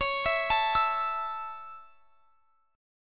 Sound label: Db Minor Sharp 5th Db; arpeggiated